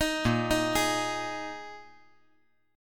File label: A sharp 7th Suspended 4th A sharp